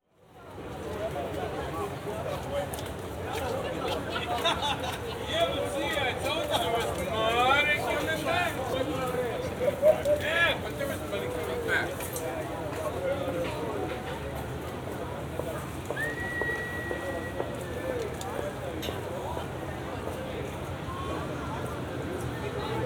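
Street Atmosphere New York, Exterior Atmosphere Ambience With People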